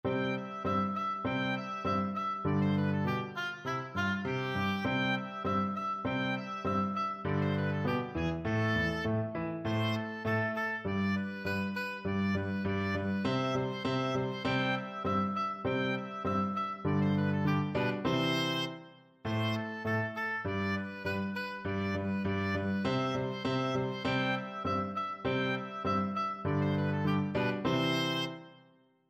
Beethoven Turkish March Ruins Of Athens Op113, oboe